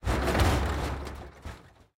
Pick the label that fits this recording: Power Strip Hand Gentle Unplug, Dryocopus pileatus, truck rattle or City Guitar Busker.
truck rattle